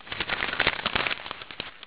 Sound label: paper crumple